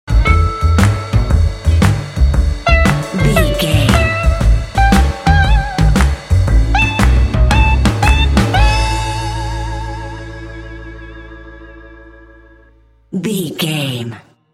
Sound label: feelin kind blue ok sting